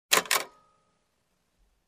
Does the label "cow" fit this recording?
No